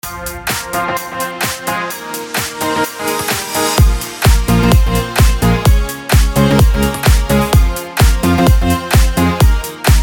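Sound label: BED PROGRESSIVE HOUSE A minor 128BPM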